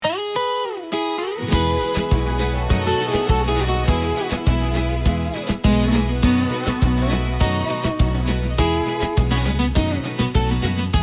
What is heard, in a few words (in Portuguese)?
pagode de viola moderno